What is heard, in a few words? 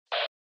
Handheld Transceiver Noise Short